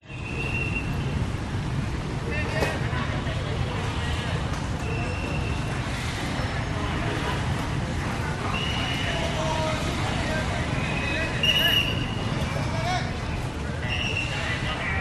city street road block police control pre presidential convoy pass vietnam